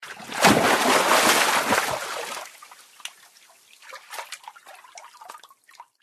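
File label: water splash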